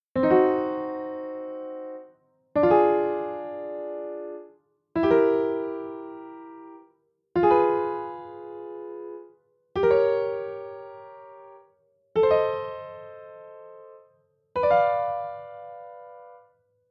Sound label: chord name diminish sound